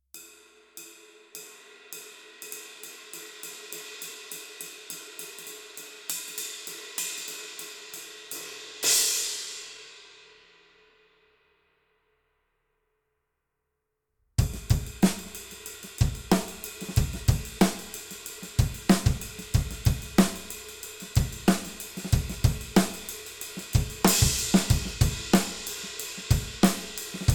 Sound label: Dual Crash Ride